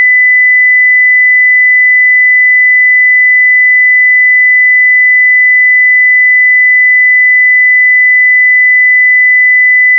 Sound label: 2kHz Tone